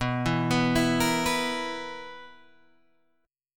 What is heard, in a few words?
B Major 7th Suspended 4th B down Guitar Open G